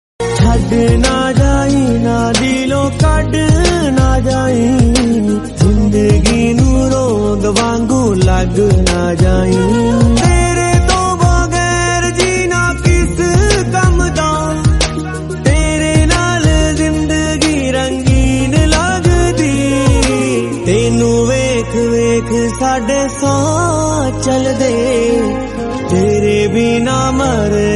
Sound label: sad punjabi song ringtone